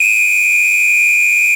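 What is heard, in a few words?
Samba Whistle High; Latin Percussion; Drums; Drum Hits; Ableton; Factory Packs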